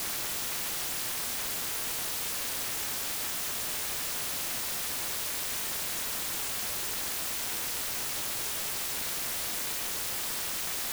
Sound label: Noise Pink, LoFi Noise; Roland BK-5